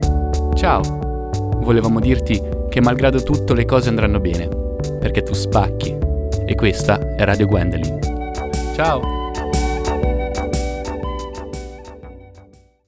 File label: Jingle